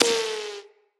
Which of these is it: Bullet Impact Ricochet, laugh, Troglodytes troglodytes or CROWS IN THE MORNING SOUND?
Bullet Impact Ricochet